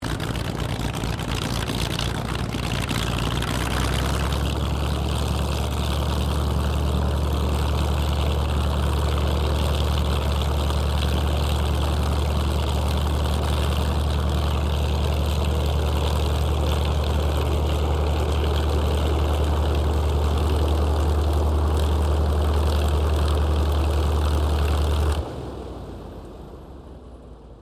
AIRCRAFT HAWKER SEAFURY TAXI SHUTDOWN STEREO, fighter-bomber; Airplanes